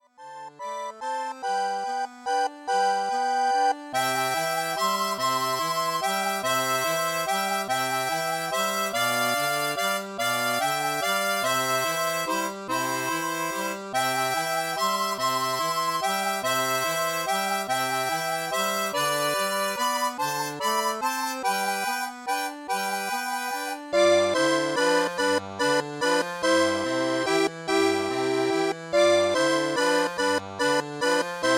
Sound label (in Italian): arpeggio; La Scogliera; Carl Czerny; Scuola dei Bassi - Arpeggi; Valzer